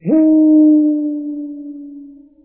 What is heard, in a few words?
wolf death